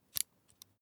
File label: meter fold in single